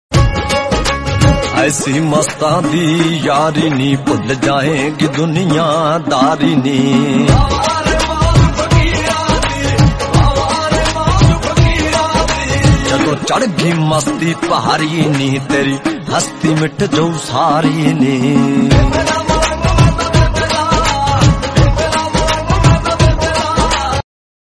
punjabi

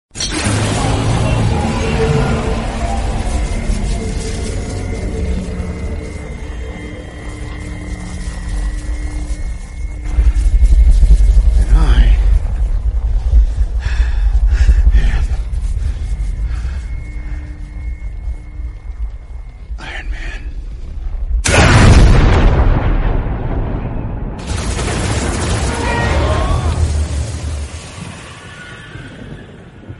bgm theme; iron man; avengers

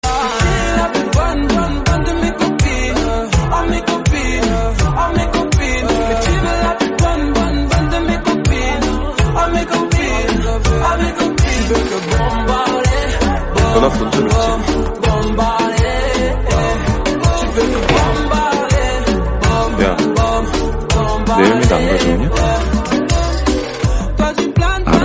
bgm, korean, instrumental; true beauty

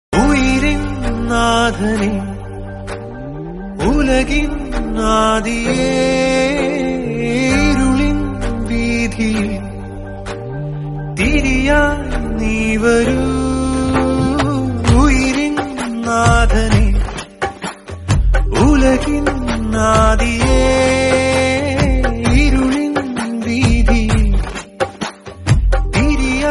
bgm malayalam; joseph

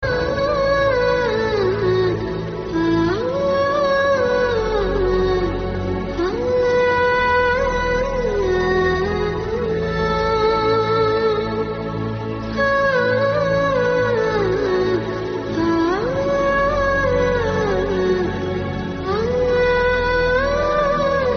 Background Music

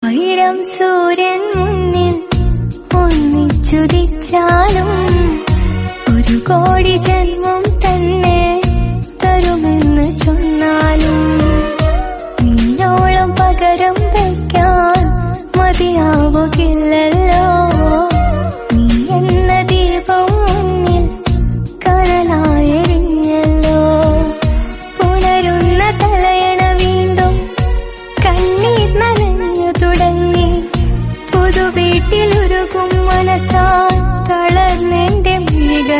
tamil; mariyaan

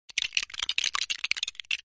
mobile typing sound effect

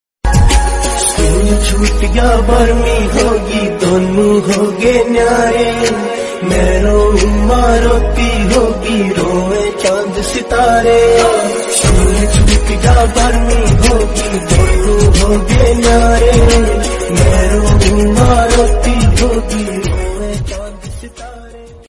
haryanvi

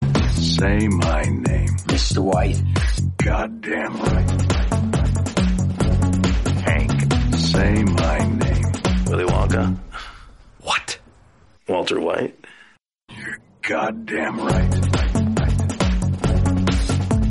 remix; breaking bad

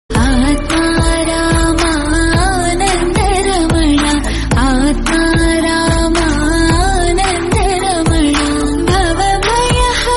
female version sanskrit

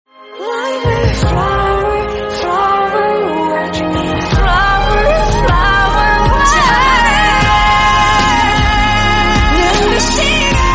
korean song

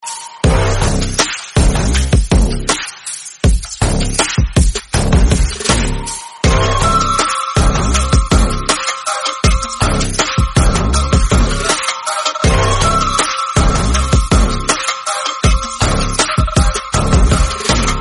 climax bgm; maanaadu

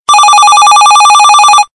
super loud

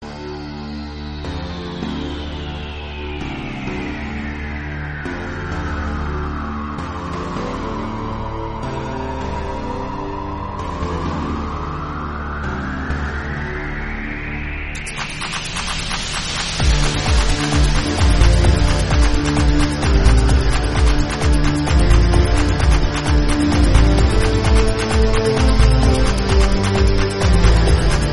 telugu background music; paisa movie

